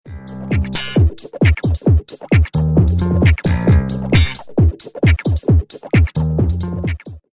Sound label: Disco